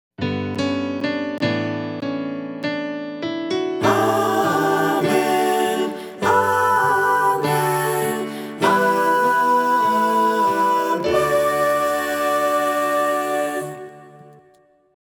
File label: Amen